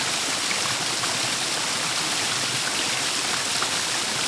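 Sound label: waterfall small; The Elder Scrolls III - Morrowind; Morrowind GOTY GOG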